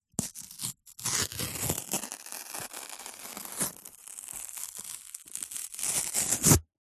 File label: foley card toilet roll rip small section; zapsplat